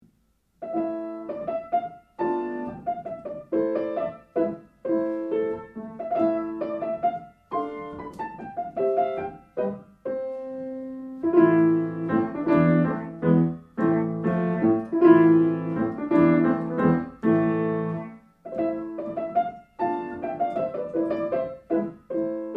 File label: old dance